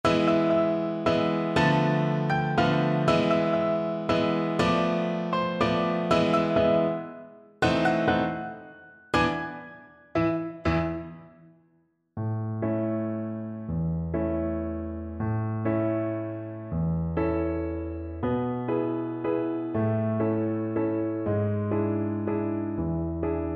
waltz violin; merry widow

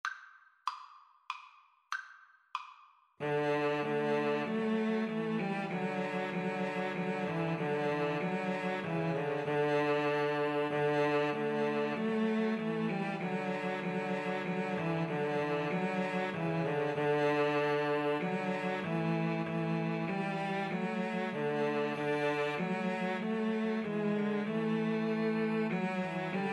Christmas Hymn trio; Slow Playalong; Wyeth, John - Christmas Hymn (A Virgin Unspotted)

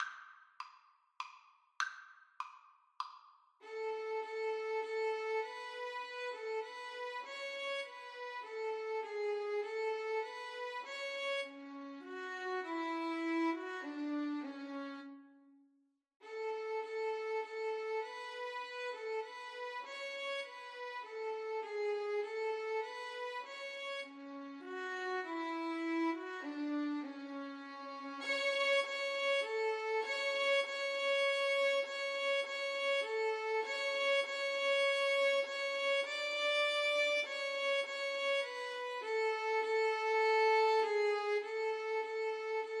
violin duet

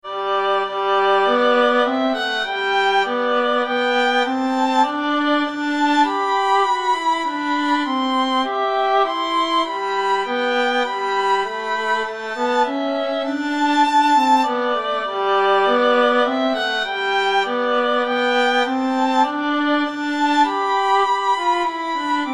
violin duet

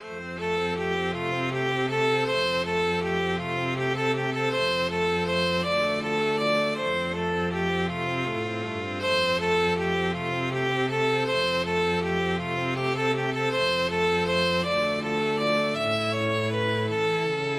string trio

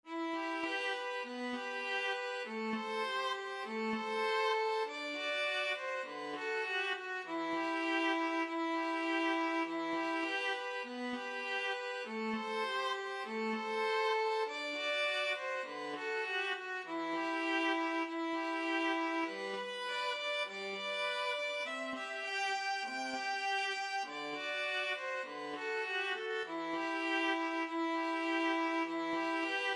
violin viola duet

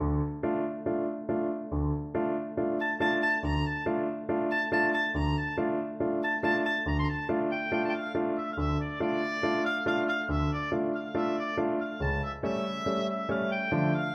barber seville overture, oboe

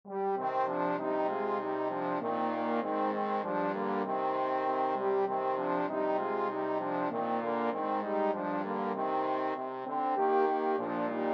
trombone trio

